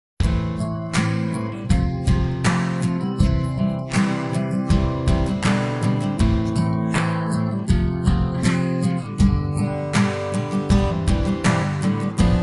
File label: accompaniment; stems; spleeter